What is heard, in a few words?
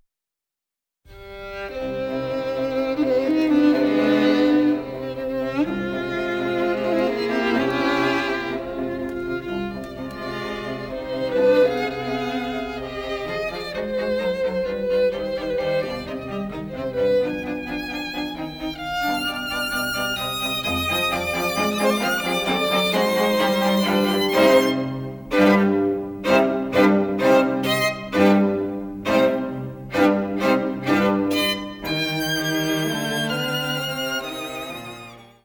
strings low loudness; beethoven; landr